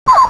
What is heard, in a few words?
crossbills flight